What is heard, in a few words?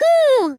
Hoo; SM64 CO-OP; Triple Baka Pack; Miku